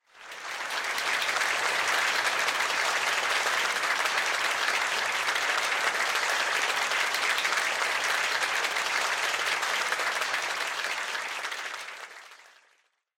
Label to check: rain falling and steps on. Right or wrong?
Wrong